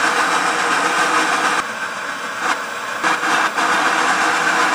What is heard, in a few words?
tv static